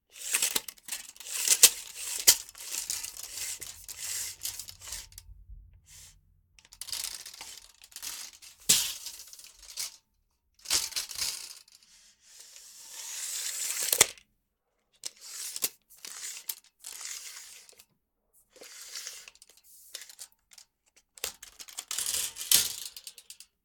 Tape Measure; Tools

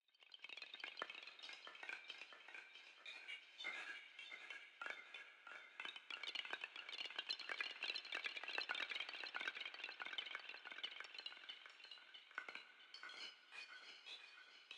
Ambience, Complex Cinematic SciFi Sounds; Federico Soler Fernandez

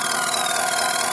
engine loop; hl2; combot

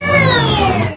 shut down; hl2

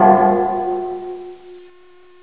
warning bell loop; hl2

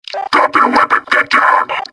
drop weapon, metropolice; hl2

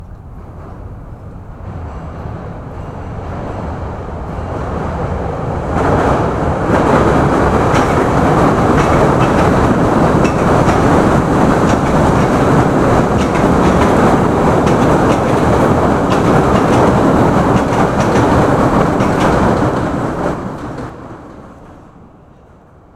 L Train Underneath Tracks Passby, Chicago; Transportation Ambiences; The Chris Alan; Chicago- Environmental & Transportation Ambiences; GDC 2020; Game Audio Bundle

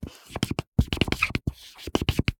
Handwriting Marker Whiteboard; SoundBits; Handwriting Sound Effects

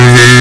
buzz, roller; hl2

